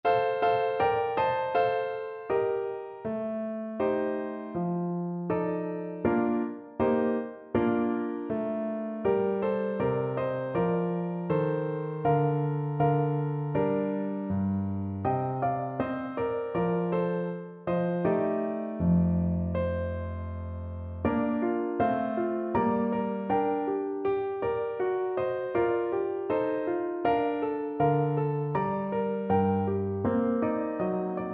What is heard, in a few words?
Clarinet; I Know Where I'm Goin' (Irish Trad.)